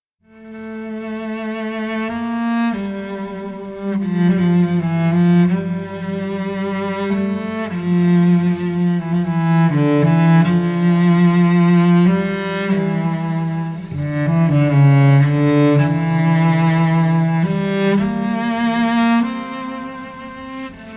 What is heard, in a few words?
cello versiyon